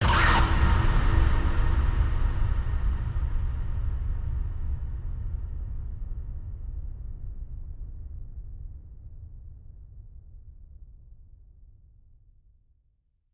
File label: shock instant; Joe's Diner